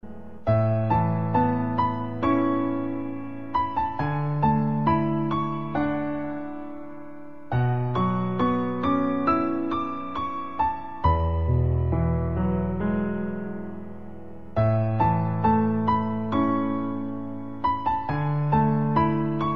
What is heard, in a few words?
background music